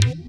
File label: EQ Perc, Percussion Mix